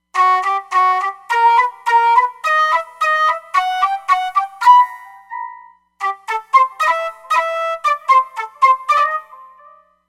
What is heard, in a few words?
Hop Flute, Roland Fantom XR